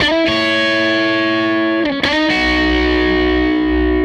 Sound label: Tele Guitar Loop; Sonic Foundry (Sony Creative Software); Six-String Orchestra